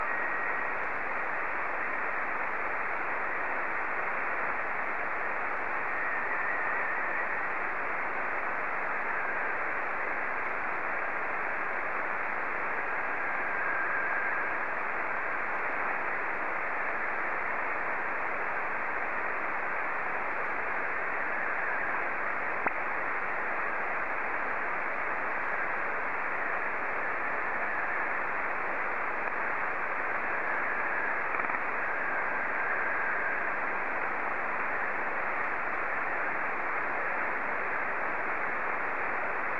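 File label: Martin 2, slow-scan television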